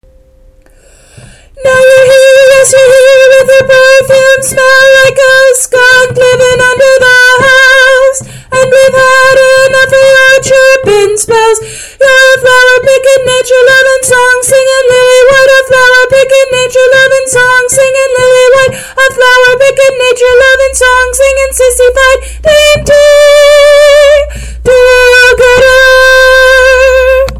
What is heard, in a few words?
soprano